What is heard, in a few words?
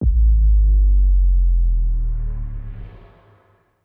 Feral Drop Hit Downer; Ninja Tracks